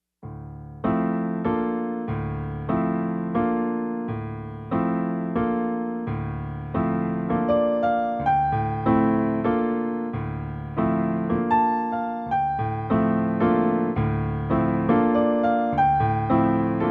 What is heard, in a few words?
etude for left hand d minor